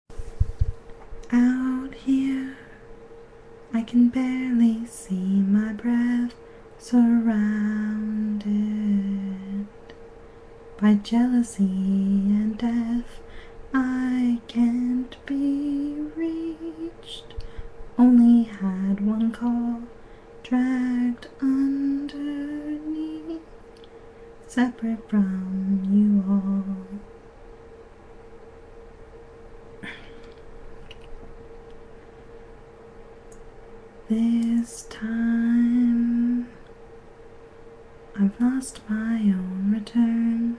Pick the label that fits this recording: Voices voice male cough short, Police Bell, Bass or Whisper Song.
Whisper Song